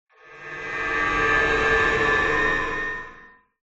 whoosh tonal rumble ascend whistle ascend one step chromatic delay hard left; Skyclad Sound